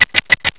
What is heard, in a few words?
CLOCK; TOYOTA